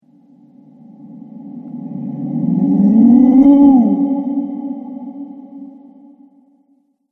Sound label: Haunting Halloween Spirit, ghost